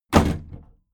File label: big plastic case lid put down heavy